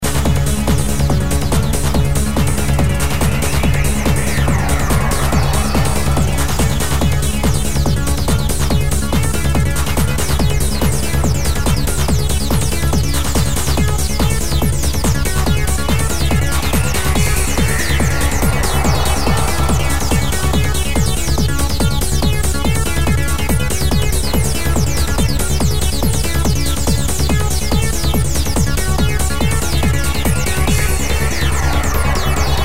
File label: Dripping Monotones